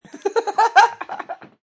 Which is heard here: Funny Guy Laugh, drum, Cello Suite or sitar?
Funny Guy Laugh